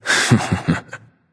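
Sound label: nonverbal heh heh; smite; voicepack; merlin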